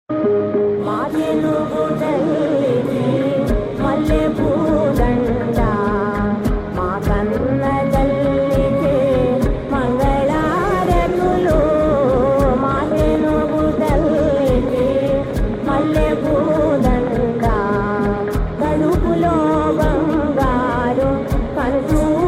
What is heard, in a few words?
telugu ringtone